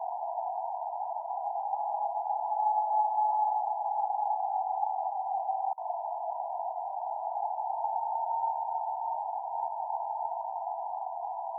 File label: Texas howl; Bigfoot; Squatchopedia